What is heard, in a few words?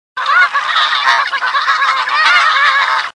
Feeding Snows